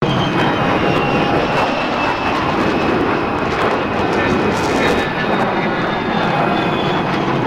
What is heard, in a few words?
Jet sound; Criminality